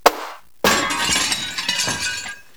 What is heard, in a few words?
glass